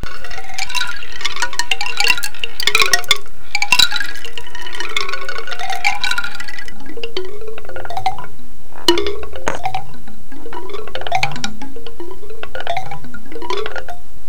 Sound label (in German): Holztrommelklang